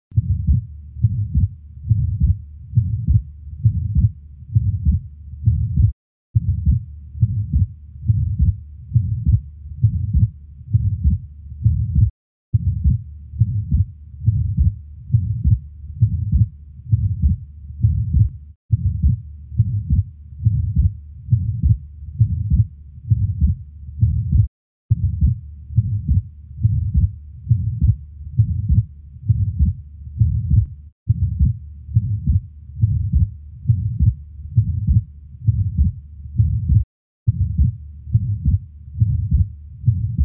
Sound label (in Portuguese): Defeito septal atrial